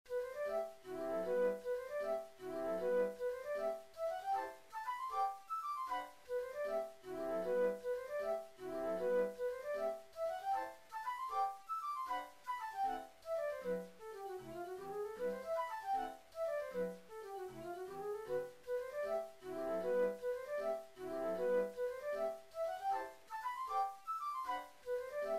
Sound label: transcribed for concert organ solo; Rebikov, Vladimir; les enfants patinent